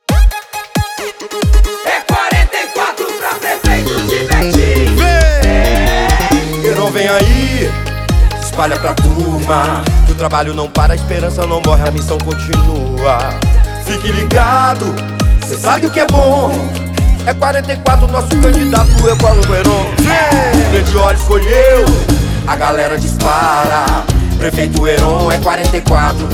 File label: JINGLE